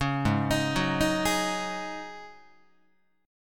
G sharp 7th Suspended 4th G sharp, Guitar; G#7sus4; 4,4,6,4,7,7; arpeggiated

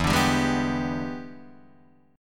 E 7th Sharp 9th Guitar Open D, chord

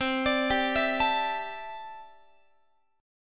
C 6th Add 9th, C, down, Guitar; arpeggiated; JGuitar

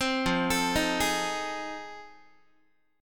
F 7th Sharp 9th, Guitar; F7#9 arpeggiated; 15,13,12,13,12,x

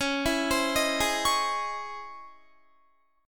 Db Minor Major 9th Db down Guitar; 4E,4A,5D,5G,5C,6F; arpeggiated

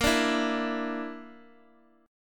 Bb Major Flat 5th Bb down Guitar Open D, chord

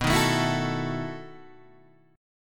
B 11th B Guitar Open G, chord

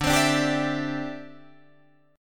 D 9th Suspended 4th D down Guitar Drop D

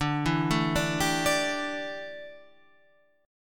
D Suspended 2nd Suspended 4th

